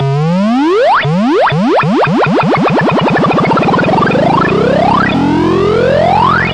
atari alarm climber; Alarm Sounds

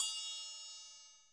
chappa Lg A5, Chappa and Chanchiki Cymbals; ETHNIC and WORLD PERCUSSION

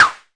Arturia MiniBrute sn

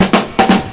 reggae break, DRUM LOOPS and BREAKS

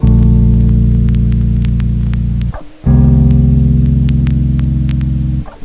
old vinyl bass ballad; MELODIC SAMPLES

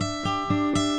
acoustic guitar picking; GUITAR LOOPS

